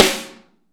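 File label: snare ambient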